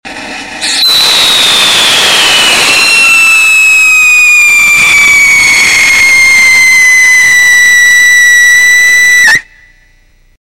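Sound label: whistle test manganese dioxide; Sodium Salicylate; Core Burner; Speed similar to no catalyst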